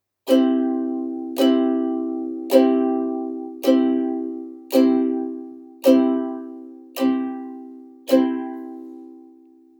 strum, ukulele

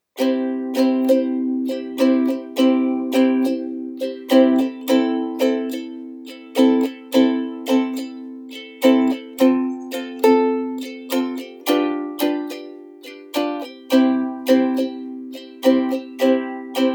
standby

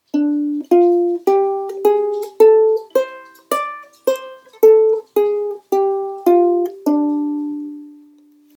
d blues scale, ukulele

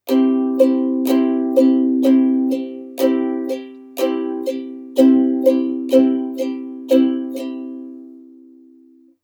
strum, ukulele

